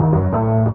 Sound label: ORG RIF; E-Lab; X Static Goldmine 2; akai